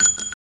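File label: SFX shotgun shell; Blood: Fresh Supply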